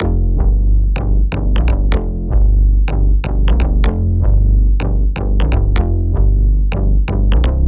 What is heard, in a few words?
Bass - Fm 125Bpm, Techno; Bass Loops; Creamfields; Ghosthack Advent Calendar - Day 6 - Techno Kit